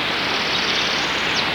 warbler N Parula; Delaware Water Gap, Coppermines Parking Area; birdsongs

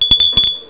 bell

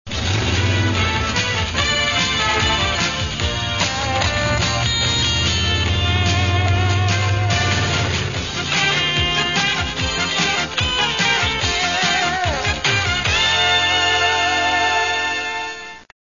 rgb music

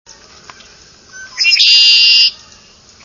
blackbird, red wing blackbird

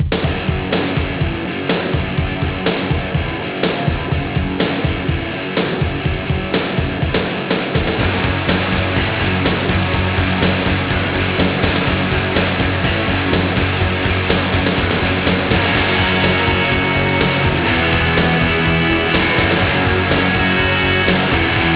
blues

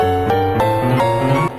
piano

